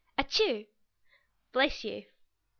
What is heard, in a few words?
atishoo bless you